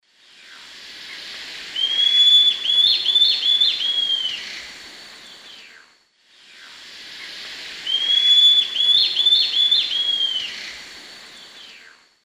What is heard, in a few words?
Rhegmatorhina melanosticta, song; S. America; Eastern Lowlands